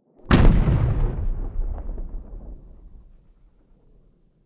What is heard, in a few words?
Cannon; celebration Events